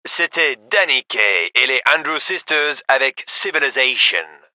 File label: music